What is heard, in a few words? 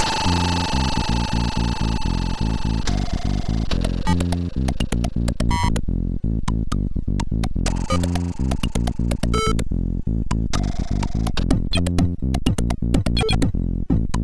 Funky Punky, MOS6581R4